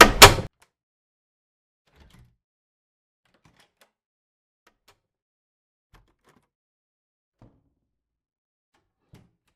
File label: door close; Minecraft; Conquest Default